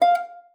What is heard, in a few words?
STOP E5, harp; Xsample VOL-8; HARP+FX